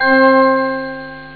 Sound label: voice; Amiga Soundtracker Sample Packs